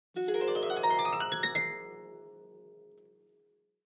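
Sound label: Harp Low Strings Ascending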